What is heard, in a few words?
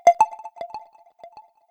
Cave, notifications; Huawei Mate XT Ultimate